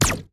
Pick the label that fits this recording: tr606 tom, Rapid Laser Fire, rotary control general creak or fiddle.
Rapid Laser Fire